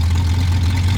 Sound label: raider loop; nod